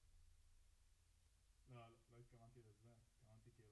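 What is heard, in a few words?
Bass Drum Out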